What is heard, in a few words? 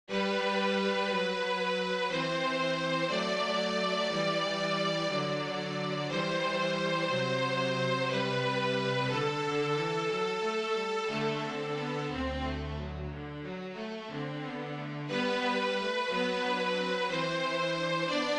jesu joy quartet